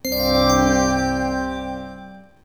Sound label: Win